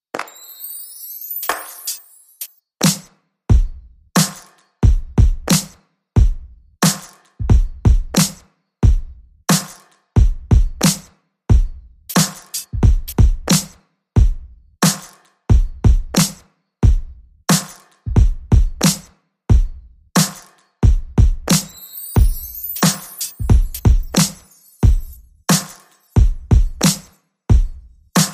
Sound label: Qwerty Beat